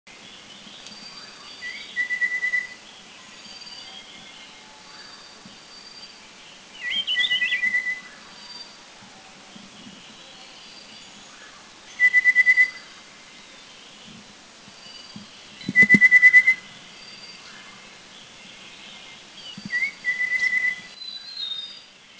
Rufous-capped Babbler, Taiwan

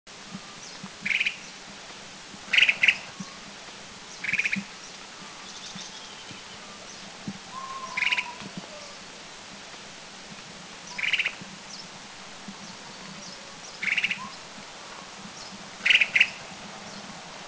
Crested Finchbill; Thailand

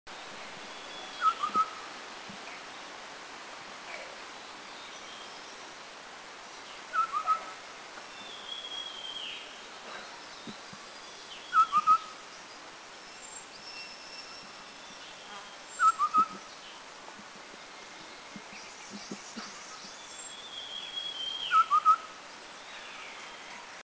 White-browed Scimitar Babbler, Dirang, India

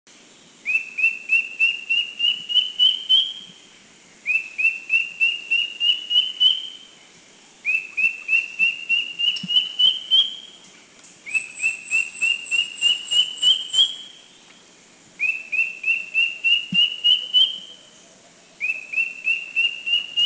Philippine Drongo Cuckoo Makiling; Philippine Drongo-Cuckoo Surniculus velutinus Country endemic; Mt.Makiling; 1 seen Mt.Makiling

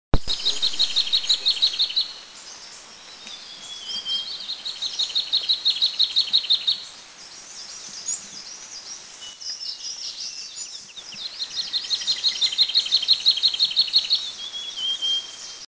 Black-eared Shrike Babbler, Eaglenest, India

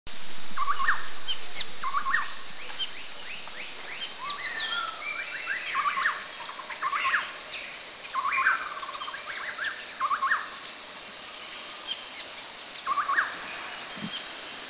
Red-billed Scimitar Babbler; Mishmi; India

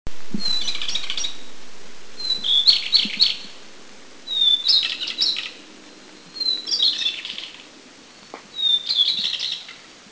Taiwan Shortwing, Anmashan; Brachypteryx goodfellowi Country endemic; 2 birds seen Anmashan top walk

